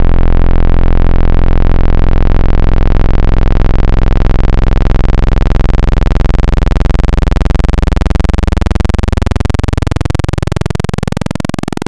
Analog PWM Square, wavetable

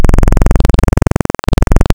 bw saw gap, wavetable; Adventure Kid

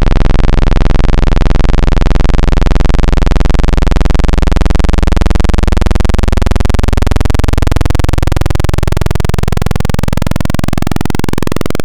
Analog PWM Square, wavetable

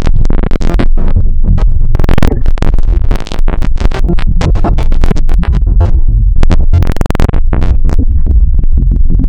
stereo wavetable 2048; Adventure Kid

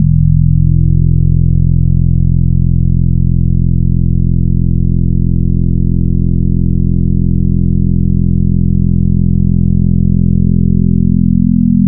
FM, Wavetables

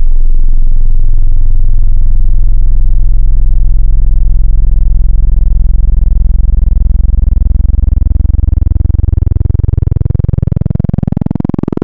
Analog PWM Sub, wavetable

